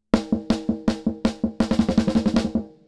Delay FEEDBACK 0

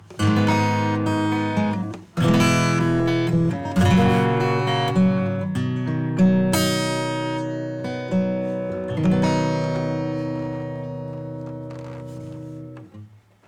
808 acoustic; SubBass Doctor

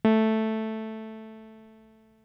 Nord Lead 4 VCA Sustain low

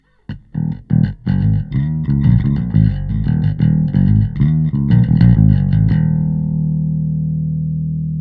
bass; Nanopulse

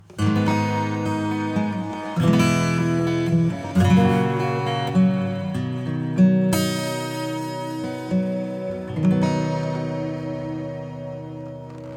Delay acoustic; Cluster; preset YESTERDAY

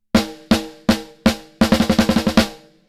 snare SNARE BRIGHT; MIXROOM

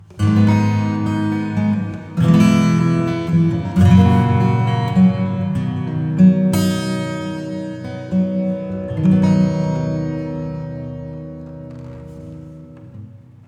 acoustic

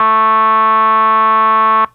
Nord Lead 4 hard

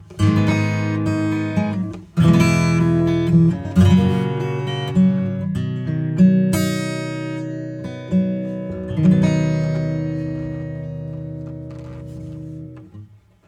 Analog Pro acoustic Tube2

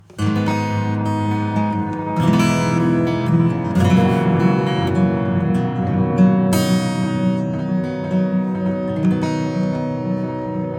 Delay acoustic; Cluster

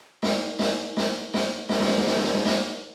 Plate Reverb; US Decay 0.9

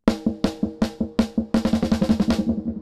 Delay DELAY MODE 6

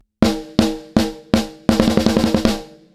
snare; Lindell 7X-500

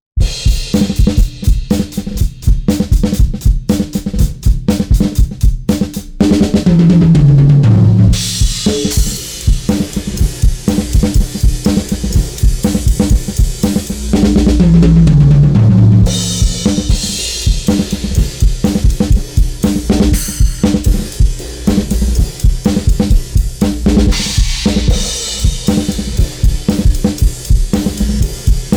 EQ Tilt Shelf low; Kirchhoff

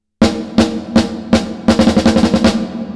snare REVERB; Joe Chiccarelli

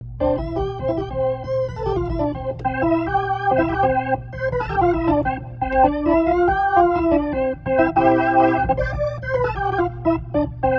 organ Jazzy Rotary; Rotary Mod

